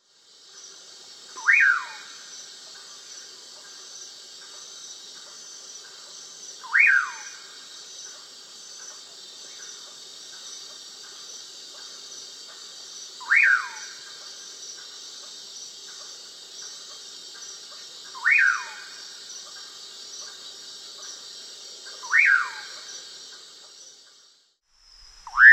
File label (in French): Engoulevent pauraqué